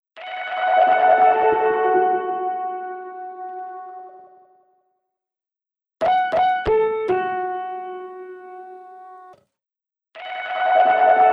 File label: Keys LIQUID REVERB; H3000 BD Mk II